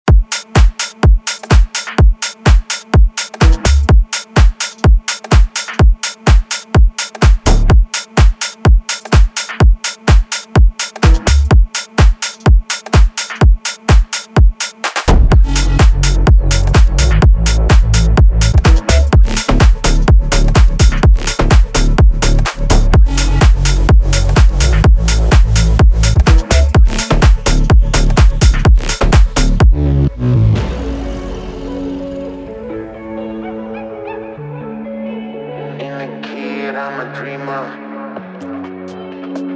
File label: INST 126BPM F#m